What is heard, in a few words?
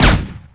Rifle Fire, Sniper Rifle